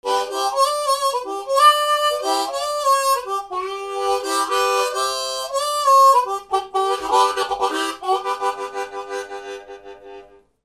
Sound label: MUSICAL